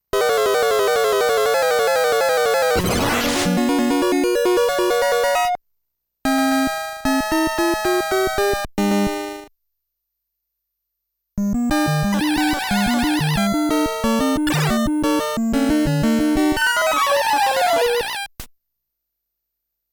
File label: Famicom main channels sound test; Famicom Adv AV v1.0